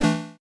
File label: Menu; TowerDefenseGame